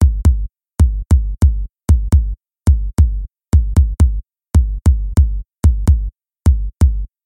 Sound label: Kick DRY; bx boom